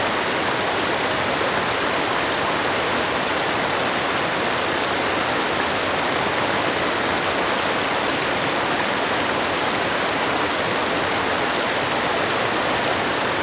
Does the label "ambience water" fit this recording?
Yes